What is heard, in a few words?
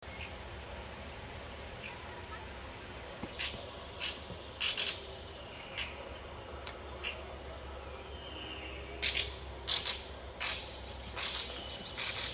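Martín Pescador Mediano, Chloroceryle amazona, Amazon Kingfisher; cantos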